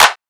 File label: Clap Hard; pitchglitch